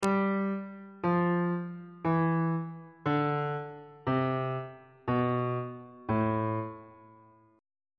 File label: aeolian mode descending